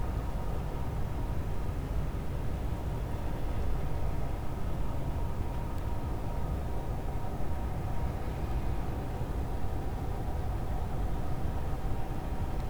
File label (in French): cachalot negatif, Physeter Macrocephalus